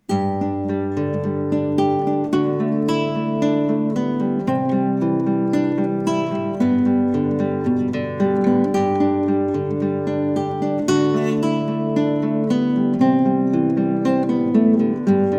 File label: Classical Instrumental